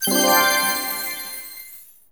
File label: RESULT GEM FLASH; maimai